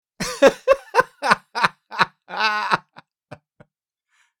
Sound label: Man laughing with giggles and smirking